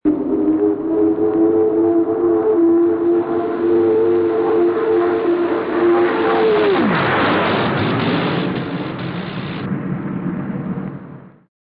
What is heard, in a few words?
Ram the plane